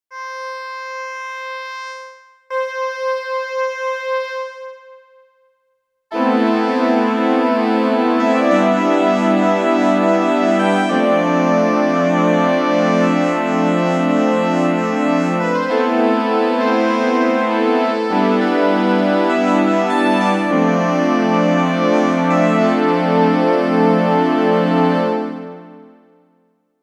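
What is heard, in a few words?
voice saw pad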